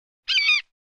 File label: Bird, Hawk; Single Screech, Excited, Medium Close Persp